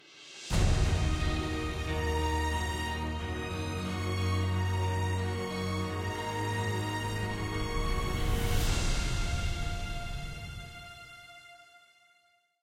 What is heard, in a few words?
Stinger; Great Wall Second Gate